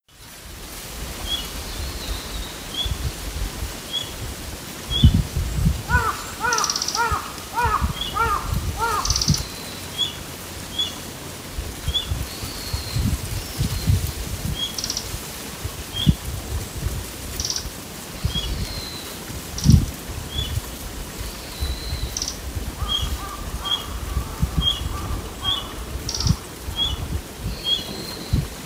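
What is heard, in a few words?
red-breasted flycatcher, okayama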